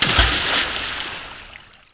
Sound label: Water Enter; Player; Heretic II Demo